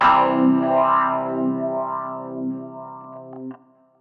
Clavinetta, deep house keys; клавишных; аудио-сэмпл

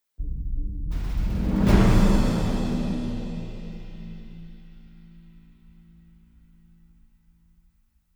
Drum ens; Сэмплы ударных для фильмов: Drum Ensemble; Beat; Drums; film music; Барабаны; Музыка для фильмов; Ударные; Movie Score; 80BPM

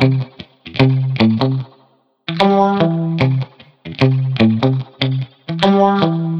Wah Mute, wah-wah

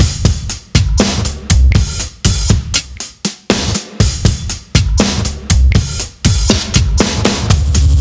Industrial, rock

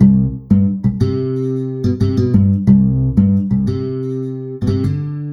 Bassguitar; бас; чиллаут

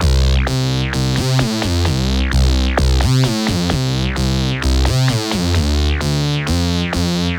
Bubble Bass, electric jazz

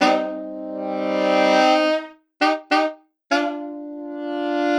Tenor; лупы

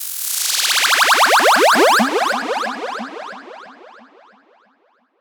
Lfo Sweep

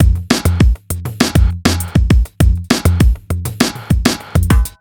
Twang

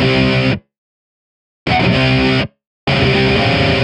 Wide Rhythm, breakbeat

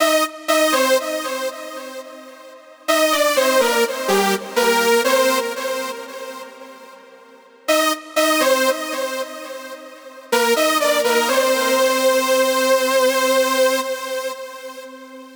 Ultra Lead; Dance Electro

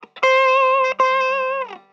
Guitar Solo

